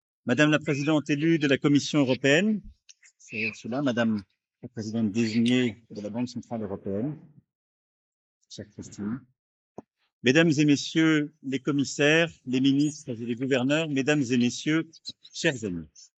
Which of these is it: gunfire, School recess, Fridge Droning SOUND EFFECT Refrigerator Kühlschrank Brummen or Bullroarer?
School recess